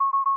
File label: 3 beeps 1000; Kerbal Space Program; Chatterer